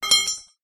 impacts metal steel twisted bar small drop hard ground